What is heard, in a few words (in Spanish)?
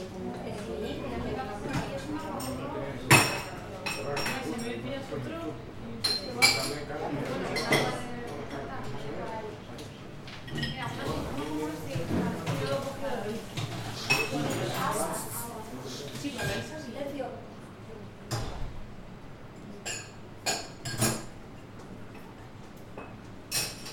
Ambiente interior cafeteria; OscarDeAvila